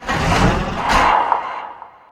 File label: mine cart creak; darwinia demo